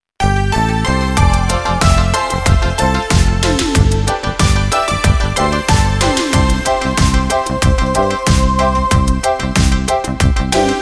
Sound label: karaoke